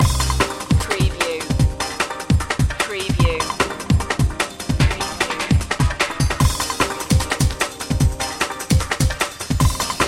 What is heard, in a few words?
aviation loop